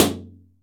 shower, impulse responses; howard fredrickson

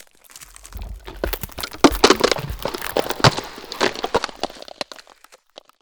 Ice Spell Freezing Slowing Glacier Slowdown; Arctic Aura

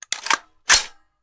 Machine Gun Foley; Sound Ideas; Guns

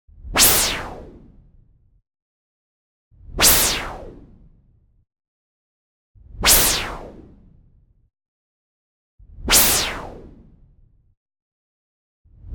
WHOOSH Noise Filter Sweep Short Sharp; boom library; Anime Essentials

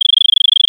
Arcade Chirp, Video Game Sounds; 1001 Sound Effects

